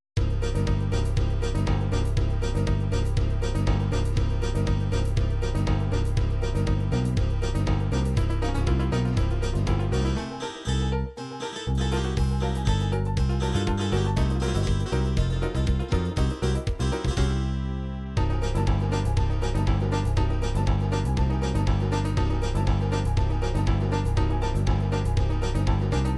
spanish espagnol

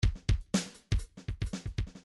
midi grooves funk

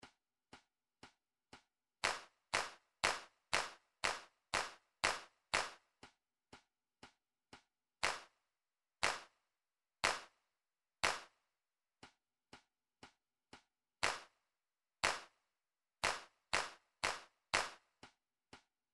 clap

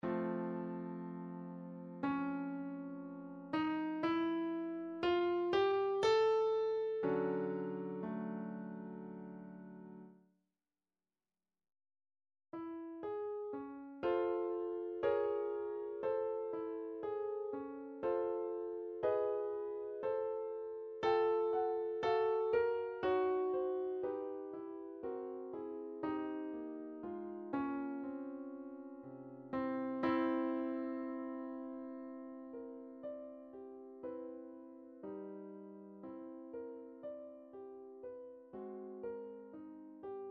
piano, midi

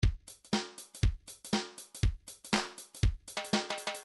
reggae 2bar

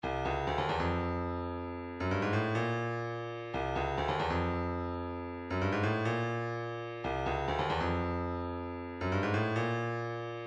137 wavedrum, midi

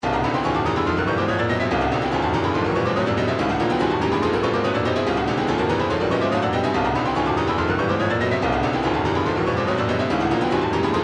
retro funk 143, midi; rebooty

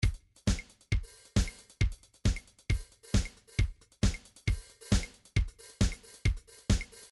trance midi loops beats latin; Newtronic Trance Constuction Kit

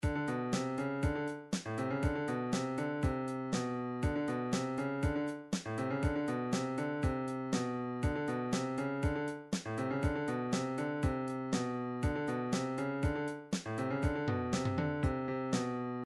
funk, midi

